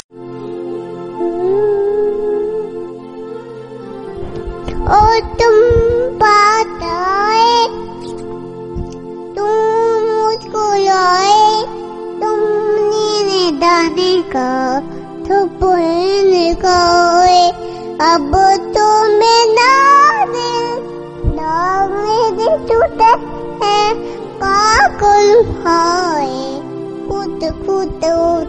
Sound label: baby voice